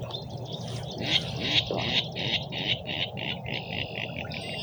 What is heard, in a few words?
grunts